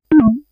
pep Sound, digital audio; kenney digitalaudio

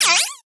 synth misc, retro synth; retro synth SFX

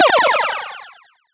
Retro Weapon Laser

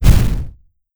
explosion; NIIIEMAND's explosion sfx

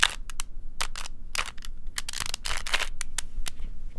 Small Cube - Twist, Rubik's Cube

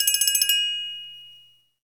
Bell Roll; FREE SFX PACK VOL 2

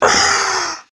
scream, creature

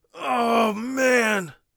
poisoned, warrior voice; Warrior Voice Pack

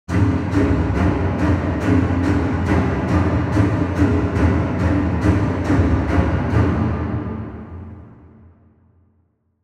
Intense Strings Low, Horror Strings

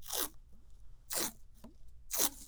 Pull Tape

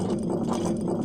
rolling; 30 cc0 sfx loops

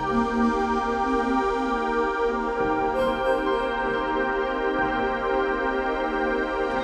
Synth Pad

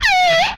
Skid, retro arcade; MMRetroArcadeSoundsPack; Vehicles

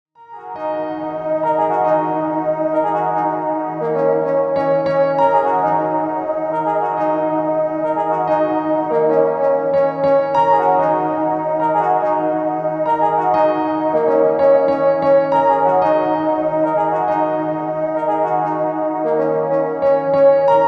Piano; Piano Pack

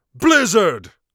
blizzard, Mage Voice Pack

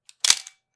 shot

